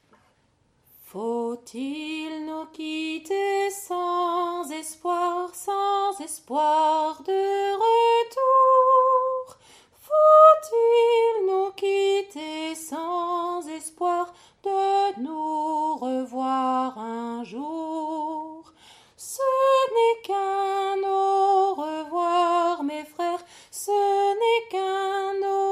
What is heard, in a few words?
a cappella